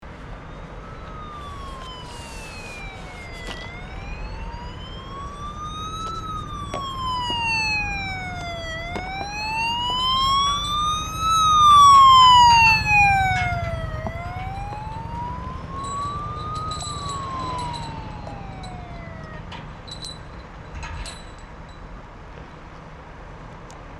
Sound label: Police car siren passing by traffic city